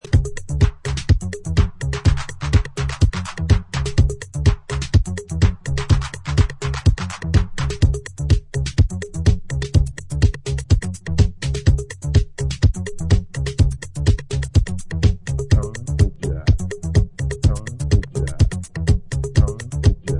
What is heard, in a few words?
House